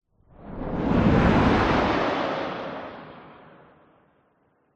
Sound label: vehicles aircraft fighter jet pass by fast designed